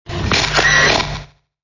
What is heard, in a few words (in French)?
Appareil photo